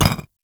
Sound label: Footstep; Warframe; Qorvex